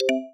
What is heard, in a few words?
alert